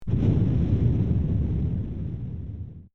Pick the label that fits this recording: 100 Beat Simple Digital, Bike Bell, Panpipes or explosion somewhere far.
explosion somewhere far